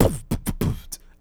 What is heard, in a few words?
Beatbox; Beatboxing